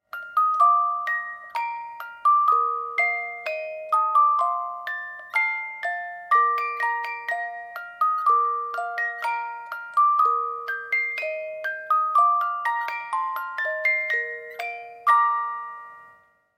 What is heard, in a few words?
flute enchantee; lutece